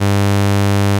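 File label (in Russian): glitch sound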